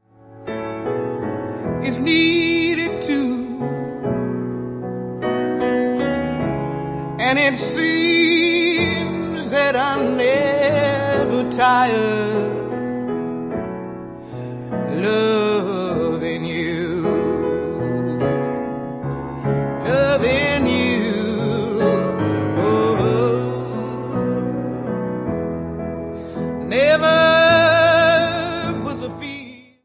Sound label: piano